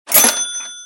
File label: pop redeem; BingoGrassland